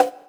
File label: Conga; Equipped Music; Loungin House